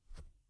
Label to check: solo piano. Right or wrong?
Wrong